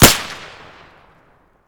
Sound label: sniper silenced fire, silencer; swb; attachments